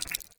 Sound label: scroll